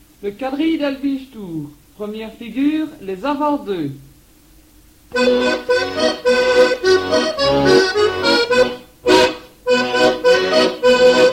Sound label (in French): Quadrille, Belgique